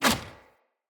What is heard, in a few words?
Bow Melee Whoosh; Weapons; Player; Bows; Hunter; EnvoyHunterBowRangedMelee